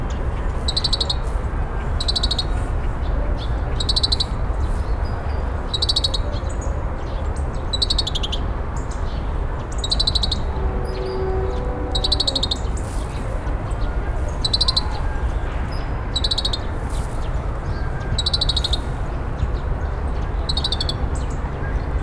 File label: oriental greenfinch; birds of china